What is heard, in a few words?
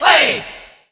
crowd hey jp, fan Club; HeavenStudioPlus